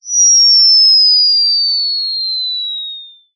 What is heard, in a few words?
cartoon descend fall whistle